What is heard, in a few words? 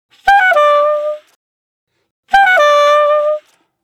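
Bass Mute; Sax Solo; саксофон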